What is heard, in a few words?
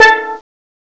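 STEEL DRUM, MALLETS; CMI Sound Library Rev 1.3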